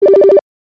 cool business buzz

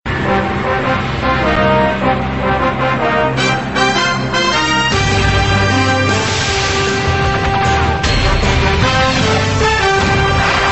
hockey canada

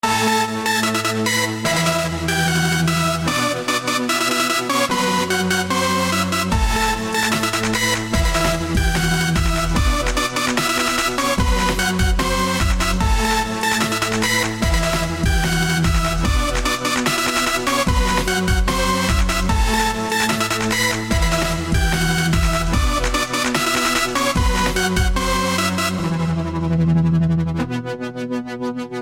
dubstep

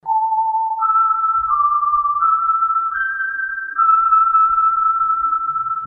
Whistle

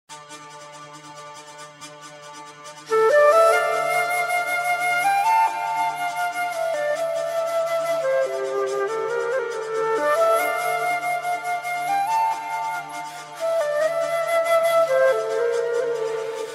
Flute

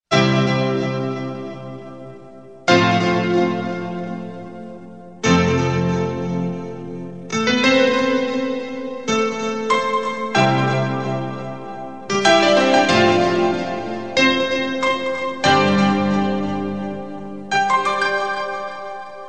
melody smooth; hip hop

